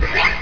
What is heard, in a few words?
unsheath; Odyssey